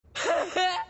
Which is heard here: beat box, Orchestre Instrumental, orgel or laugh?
laugh